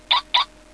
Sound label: Toy car alarm